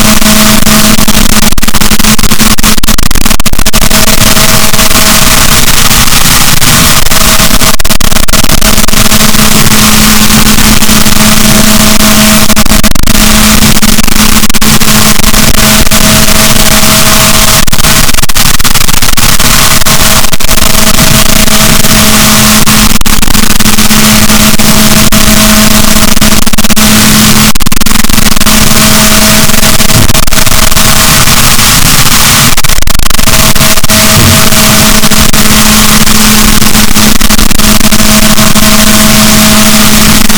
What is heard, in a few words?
rorquals, Balaenoptera Physalus, boat; rorquals positif; Chile